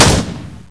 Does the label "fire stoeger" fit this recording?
Yes